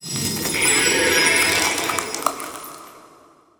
crystal, lithoids; lithoid portrait; Stellaris

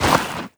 fungoid pops move; Stellaris

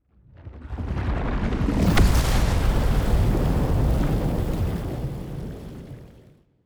colossus megastructure hit; aquatics

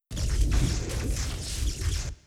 space cloud attack; Stellaris; other entities

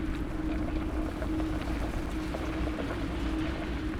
hive minion idle; Stellaris; guardians

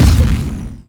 kinectic artillery fire; weapons; Stellaris

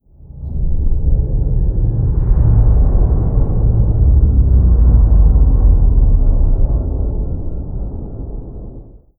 colossus death; avian; apocalypse

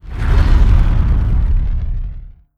nemesis colony ship moving out; Stellaris

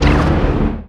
laser hit, weapons; Stellaris

